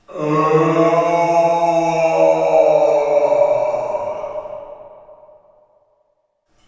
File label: prison pain; Quake 4 Alpha